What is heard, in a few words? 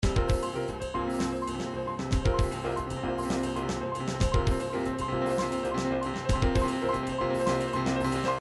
triphop; Newtronic Triphop Construction Kit